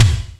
DDR30 BD; Crypton - DrumBase5000; RZ1

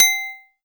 Akai XR10 Triangle; Drums

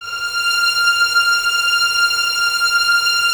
VNS F 6, Violins, String Ensembles; Miroslav Vitous

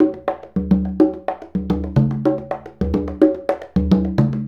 Congas; Essential Percussion

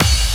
COMBI, Houseworx; Ueberschall; Workz-9

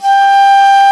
SHAKU, WOODWINDS; Roland - LA Composer vol. 1

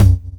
tom, House Oneshots; E Lab; XTc Files of House; Toms

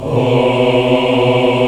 VOX, African Aahs; Roland LCDP11 Africa VOL-1

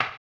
HAMBONE, Vocal Blues Soul; Spectrasonics; Planet

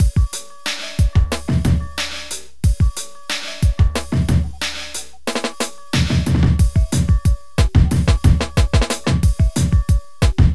Drums, Hip Hop

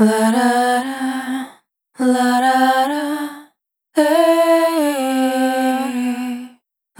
Dry, Multi-Voice Loops, Vocal Samples; Sonic Foundry (Sony Creative Software) - Pocket Diva World Class Vocal Samples

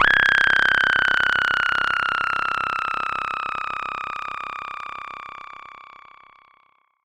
FX, 5U Modular; Polyend; Simulacrum Sounds; 1 Shots; Synths

